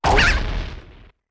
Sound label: Deku Link Launch